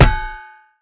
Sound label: hammer hit; Smite; Bellona